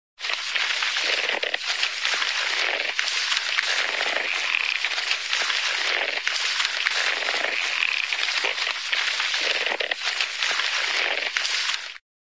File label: vine growing vines; cartoon